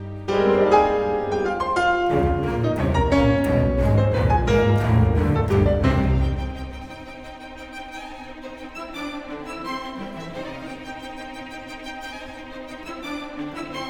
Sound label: Concerto for Piano Trumpet Strings in C Minor Allegro con brio; Classical